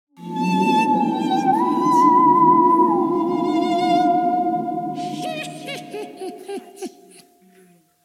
Spooky Halloween Court scie musicale rire de sorcière

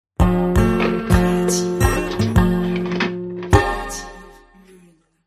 guitare folk

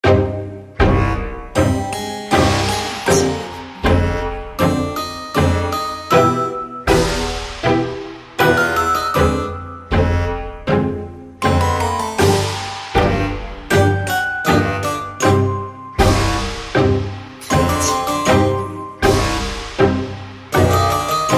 pizzicato choeur

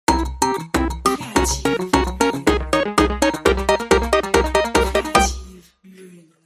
Pinball Breakthrough synthé percu

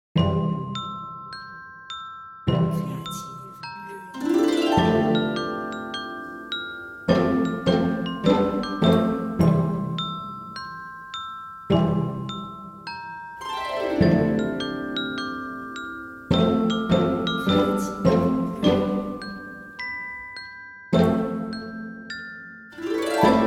clochettes pizzicato

